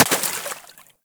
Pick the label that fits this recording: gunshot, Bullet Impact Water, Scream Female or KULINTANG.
Bullet Impact Water